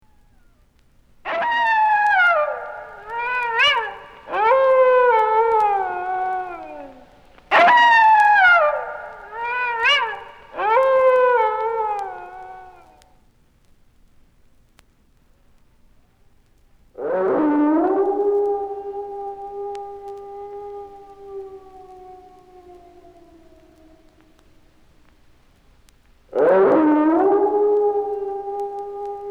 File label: Howling Dogs, Haunted House; Halloween